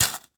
Industry Tool Shovel Small Metal Shovel Stabbing Dirt